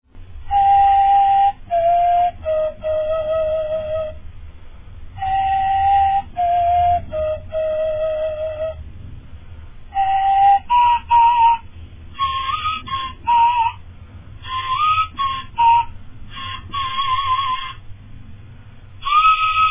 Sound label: flute